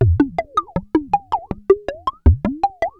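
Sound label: percussion, ambient, 160; eJay; Drum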